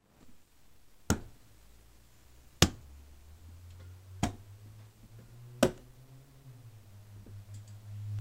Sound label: Thumps; ludum dare 46